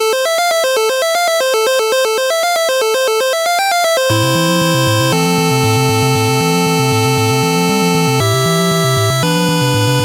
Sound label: 8-Bit